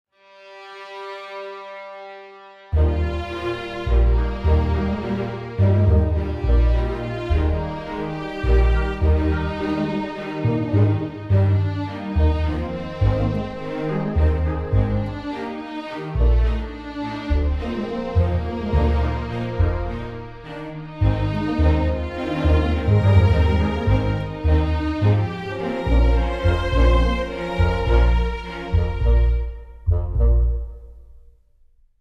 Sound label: Theme Orchestral